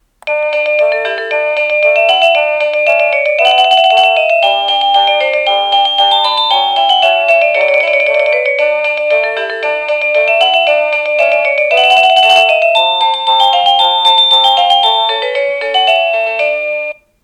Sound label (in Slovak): Ukážka melódie zvonenia zvončeka